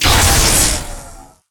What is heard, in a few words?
Plasma Grenade Explosion